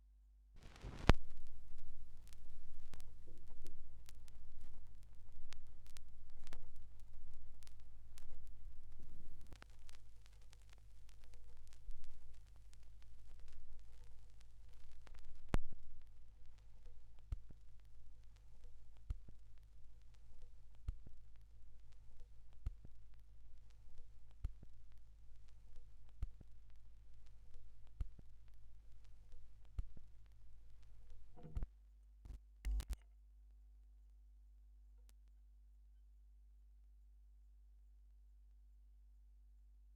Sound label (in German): Schallplattengeraeusche